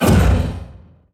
abyss success

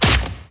decap attack

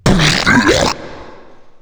head dies; pestilence

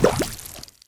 rotten heart

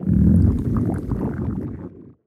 growl large; fistula

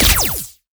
lightning medium; redlightning

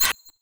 tooth and nail tick

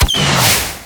rocket launch tiny

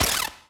fly death; horizontalrunner

